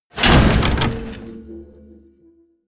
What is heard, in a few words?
mechanical lever metal large down